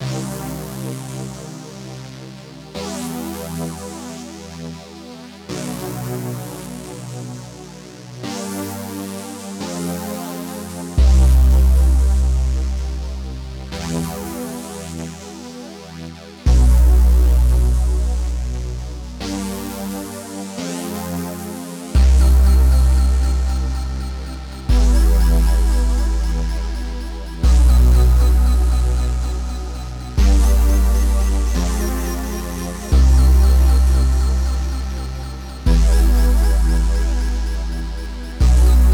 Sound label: dark forest; nordlicht13; gravedigger